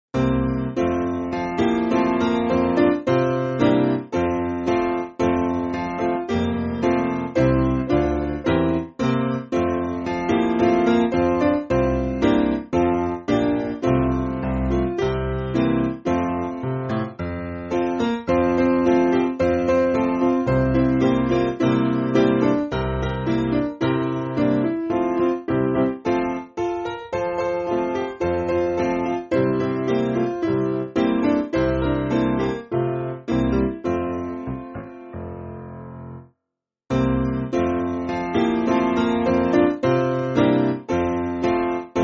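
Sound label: Piano, Music Backing Files for Churches; I Have A Song; Othello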